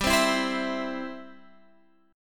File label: C Major G